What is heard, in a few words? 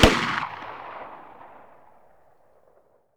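9 mm exterior shot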